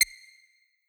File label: beep